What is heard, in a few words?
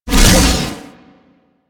Shield Launch; Enemies; Weapons; Warframe; Corpus; Arachnoid Camper